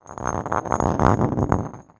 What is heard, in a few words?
Rolling; blue archive